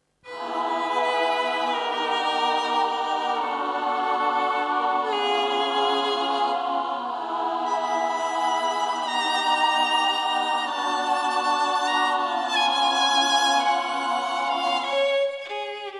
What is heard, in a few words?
Choir and Bohemian Violin dry; VSL MIRPro3D; EW Elves